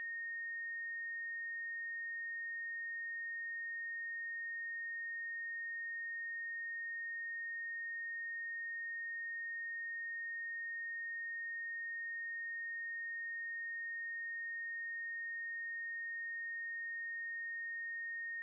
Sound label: Test 1850 hz